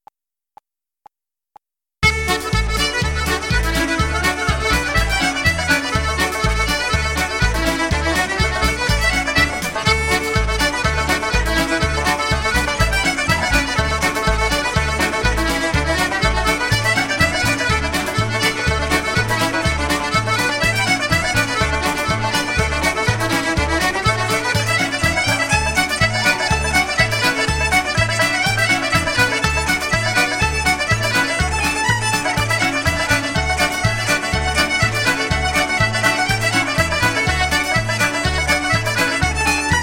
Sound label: Traditional Irish Reel D; backing track